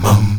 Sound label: MUM G#2, Heart of Africa; Spectrasonics; STAC. MUMS